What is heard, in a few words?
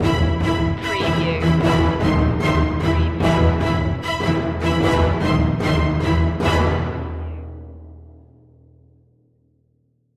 neon nightscape final stinger